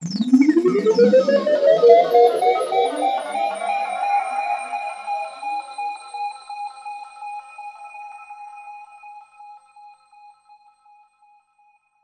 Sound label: Lazers